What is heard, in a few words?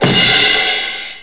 cbar hit